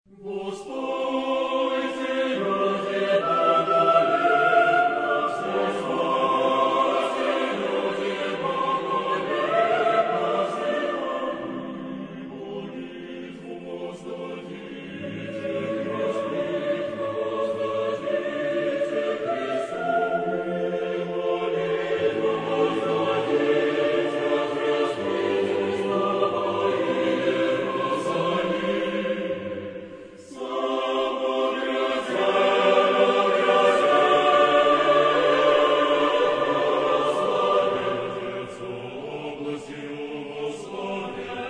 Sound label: double choir; Bortnyansky; Sacred Concerto for double choir No8; Ye people sing a song